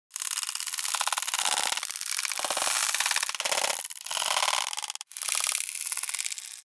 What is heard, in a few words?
Insectoid creature alien clicking